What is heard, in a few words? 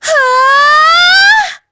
Casting, Vox; en; voices; heroes; kings raid